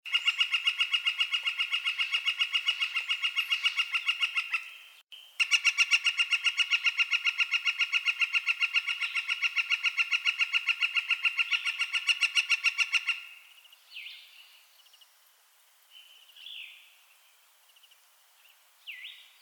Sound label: Northern Flicker, Colaptes auratus collaris; woodpeckers